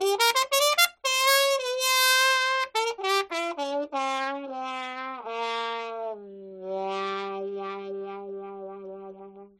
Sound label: bling trumpet